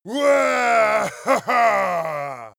male victory deep

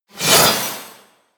scrape

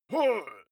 male shout hit soft

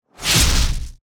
short fat knifish

